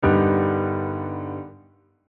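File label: polychord resonance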